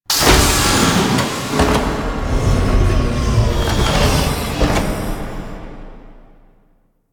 Op Chair Open; Warframe; The New War; Cinematics; Orbiter Return

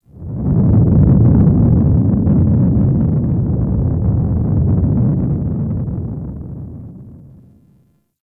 Orbiter Low Rumble Start; Ambience; Gameplay; Warframe; The War Within

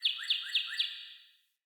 Cardinal Male Bird Song

